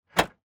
Car Trunk Open

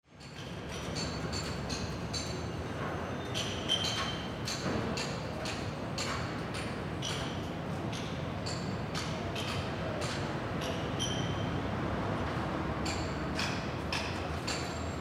construction

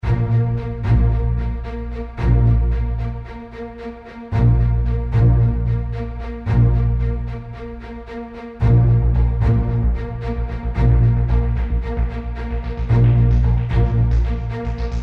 Orchestral

